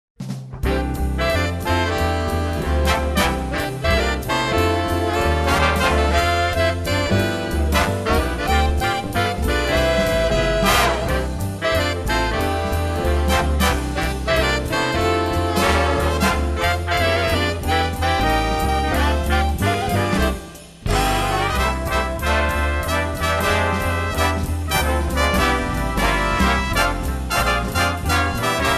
JINGLE BELLS SWING BAND

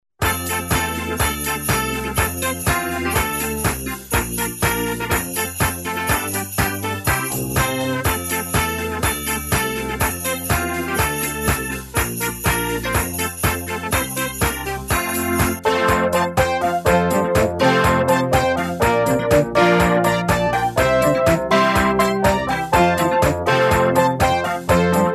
JINGLE BELLS HAPPY

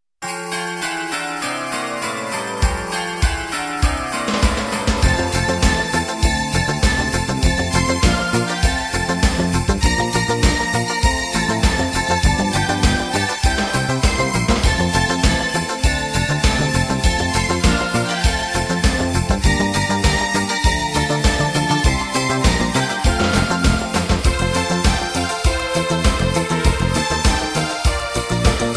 JINGLE BELLS INSTRUMENTAL

